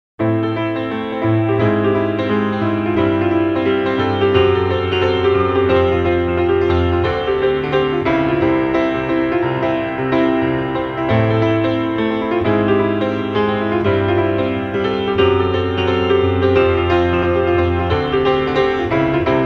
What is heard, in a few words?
Jazz